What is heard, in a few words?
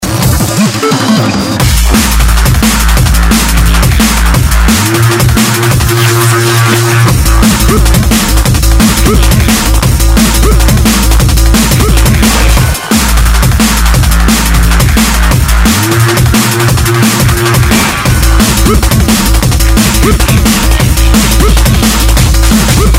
Drum'n'bass